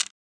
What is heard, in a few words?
marker place, roulette; advanced-roulette